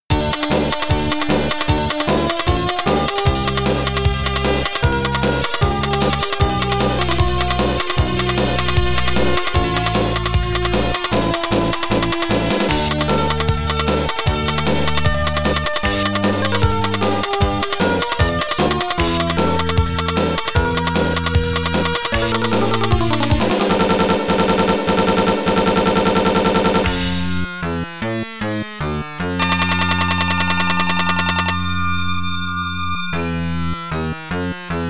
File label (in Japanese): 戦闘; Dragon Knight; X68000; ドラゴンナイト総統